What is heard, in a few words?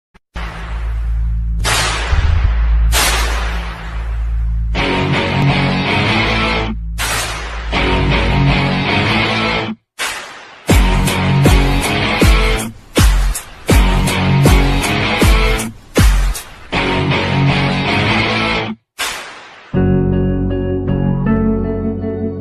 bgm ringtone background bgm; adithya varma; monk entertainment